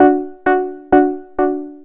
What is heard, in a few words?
KEY, 2 Step; USB Soundscan vol.51; House Side Of 2 Step; AKAI